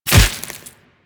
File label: Infested Splitter Gore Spew Burst; Warframe; Grey Strain